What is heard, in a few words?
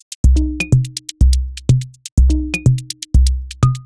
Top G 124bpm, House Drumloops; 90 s Sample CDs; Zero-G House Fabrik Drumloops; ZG Drumloops